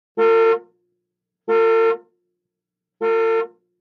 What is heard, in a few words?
Car horn, 1970's ext.